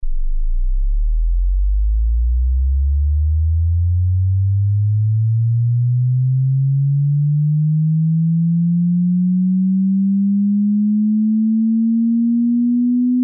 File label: Bass 20 to 280Hz; Тесты